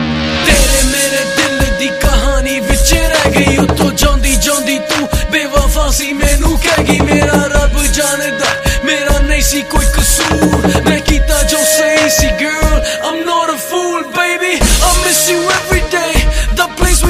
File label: New Remix Ringtone